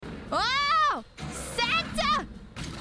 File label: wooooow; IRyS; Santa